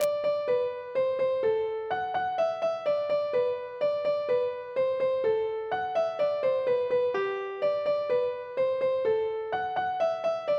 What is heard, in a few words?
polka; boeuf trad